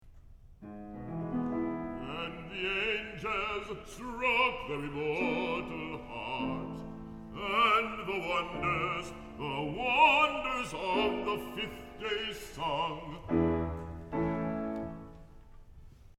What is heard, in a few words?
Recitative; Raphael; And the angels struck their immortal harps; creation